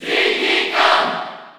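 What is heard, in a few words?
Cheer French, crowd; Diddy Kong; SSB4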